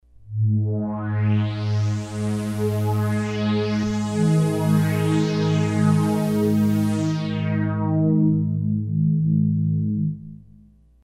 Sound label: Big Sweeper; Kris Rhen Presets